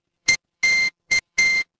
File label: ui beep